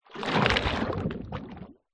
leisure plastic item large submerge push under deep water bubbles